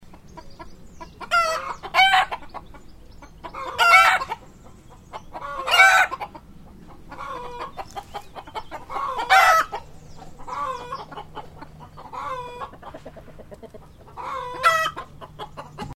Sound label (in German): Huehner